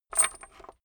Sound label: small metal drop on wood soft; industrie wirtschaft; metall